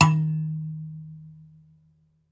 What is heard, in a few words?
BASS KALIM, Kalimbas